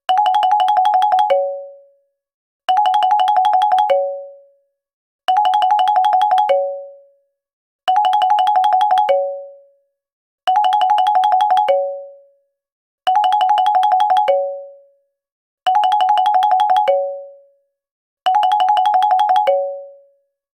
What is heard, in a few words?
Simple Bell, ringtones; Optimus L3 II E430 Movistar